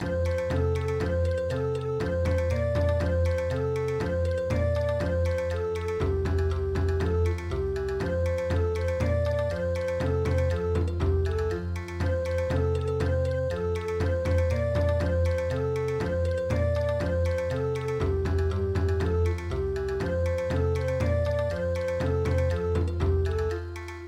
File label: Bon Odori; traditional